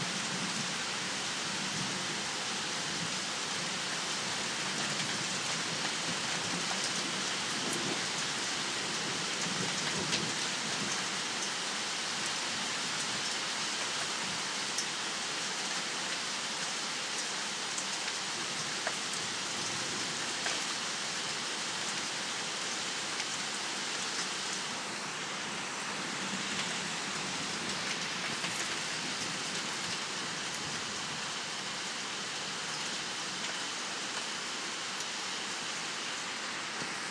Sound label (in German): Gewitter Regen und Hagel hier in Stuttgart